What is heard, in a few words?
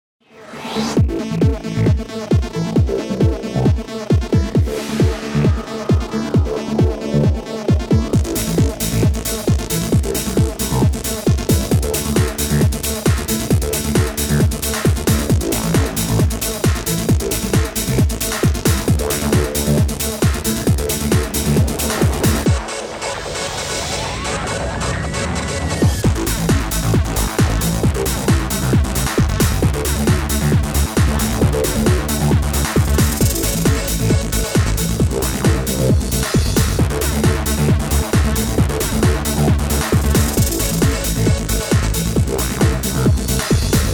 dance